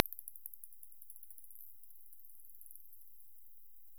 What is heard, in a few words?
buzz